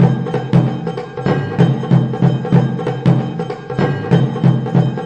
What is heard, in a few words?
japanophile, drum loops and breaks, 95 bpm; 091 to 100 bpm